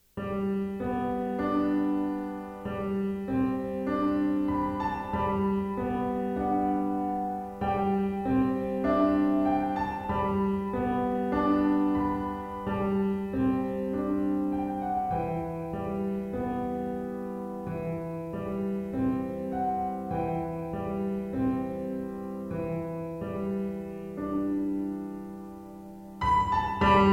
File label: piano pop